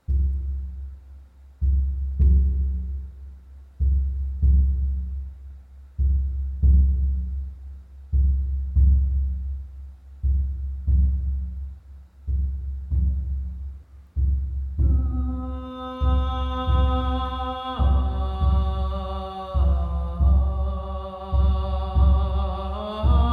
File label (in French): Voix et tambour amérindien